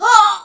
Death

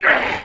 Hit

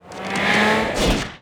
grapshot

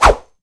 Weapons Swing

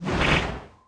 Woosh

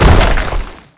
Die

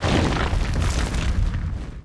Dead Mob Stone

